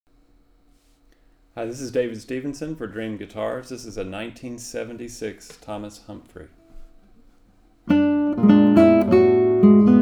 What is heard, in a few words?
concert, classical guitar; nylon-string guitars; humphrey thomas